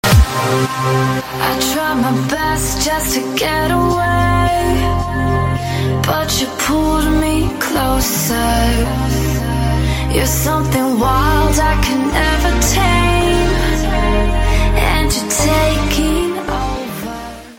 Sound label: samsung crash ringtone